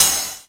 CYMBAL, Electronic; CYMBAL P2

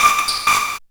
MORSE160, Hard & Loud Techno

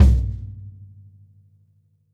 kick - snares on; JazzFunkKit

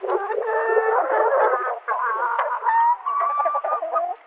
Chickens

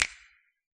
hip hop kit finger snaps

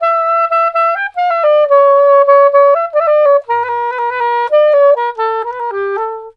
SAX, Balkanish Traditions

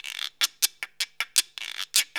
Roots Reggae; Sounds Good - Roots Reggae

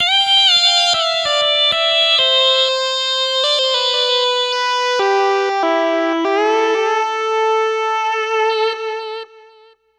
80s Lead; Riffs & Solos; Dr. Fink's Funk Factory

